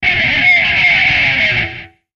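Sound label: Music Guitar Pick Scrape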